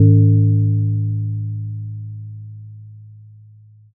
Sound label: gong